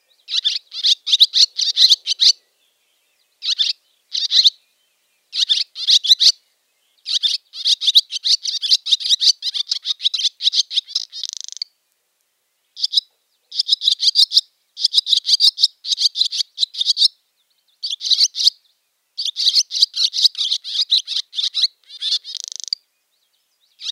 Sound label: Barn Swallow